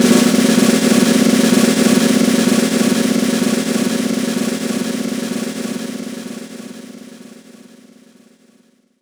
SNR Roll; Roland M-OC1

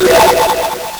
LSDj, Nintendo Gameboy; 300 Drum Machines

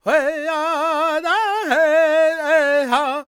C# MIN, World Voices, LAKOTA; Spectrasonics Vocal Planet CD5

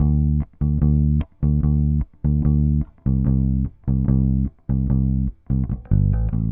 Bass, Indie Rock

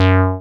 SYN TBM G3, MC202; Sound & Vision - Gigapack I CD 2

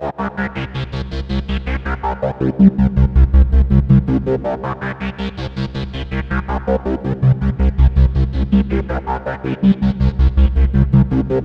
C2, SynthLine; 90 s Sample CDs; NiceBeats; SynthLine VOL-10

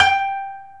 KOTO G4 -R; USB Soundscan vol.60 - Asian Traditions; AKAI

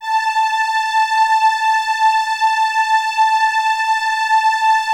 LUXURY A6, LUXURYPAD; Drums In Your FACE!

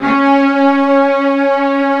MIDI CELLO, SYNTH STRING; Greytsounds VOL-1

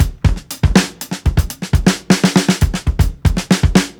BUSY FUNK, breaks, drums; Basement drums vol 2

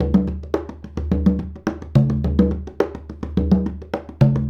Congas; Essential Percussion

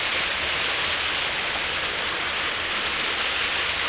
Ice Cave, On The Glacier

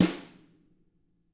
TAMA BR F, Drums; ILIO; Double Platinum Drums 1

